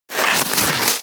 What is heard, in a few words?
Armadillo Roll Snow